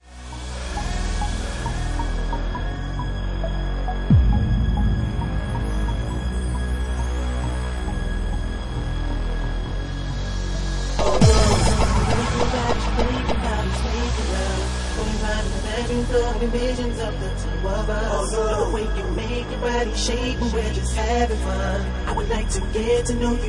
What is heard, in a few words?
Bassline Mix